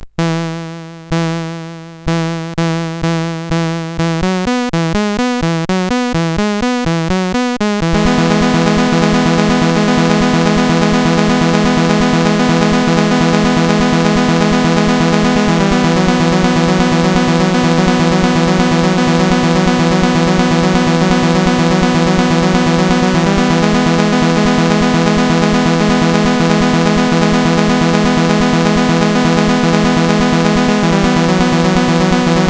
Reverb CSG8580R5

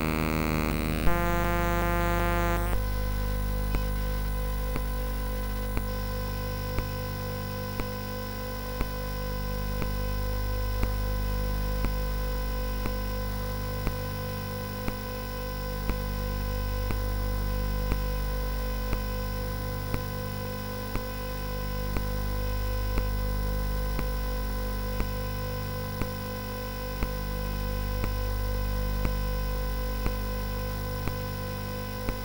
Pop Music sid CSG8580R5

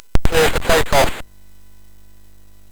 Jump Jet, sid MOS6581R4; hvsc

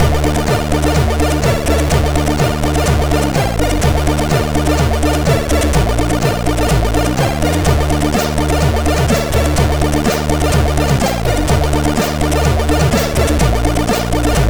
Intro, MOS6581R4; hvsc

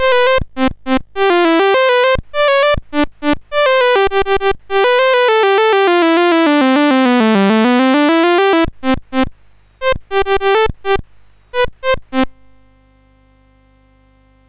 Chopper, MOS6581R4

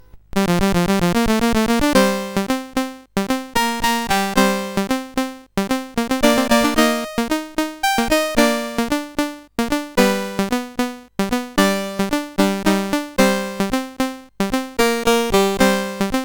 Hillsfar, sid CSG8580R5

